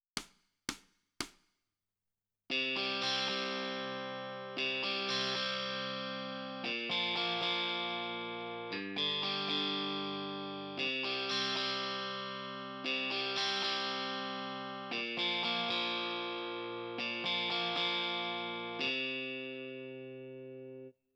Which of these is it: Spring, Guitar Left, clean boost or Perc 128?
Guitar Left